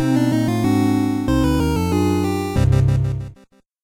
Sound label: gameover